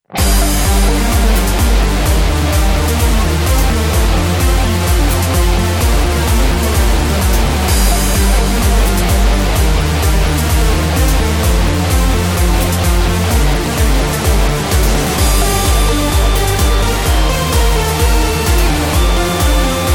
heavy super saw

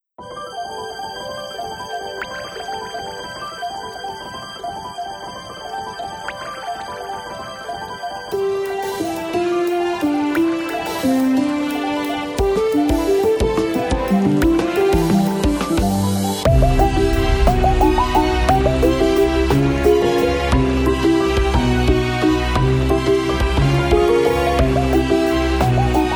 waltz of water droplets

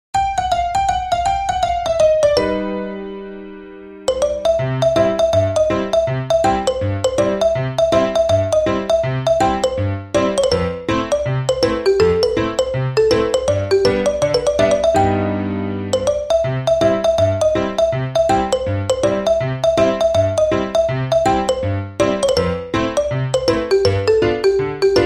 light ragtime; heartwarming

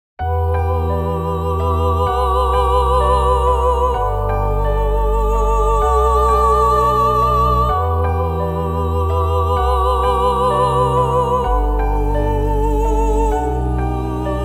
empty fantasy

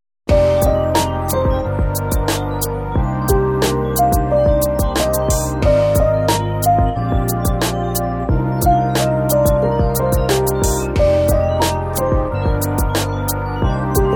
autumn beat

